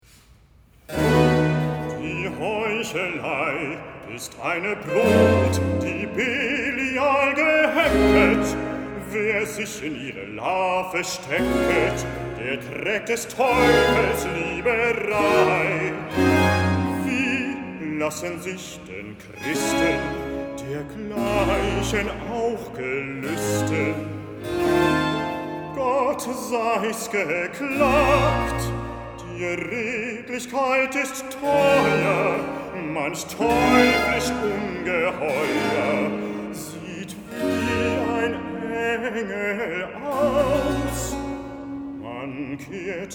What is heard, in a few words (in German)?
BWV24 Recitativo basso Die Heuchelei ist eine Brut; Vor deinen Thron